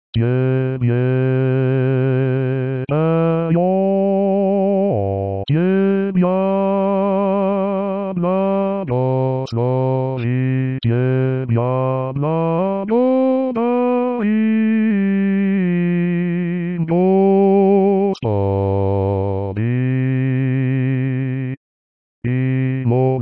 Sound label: Basse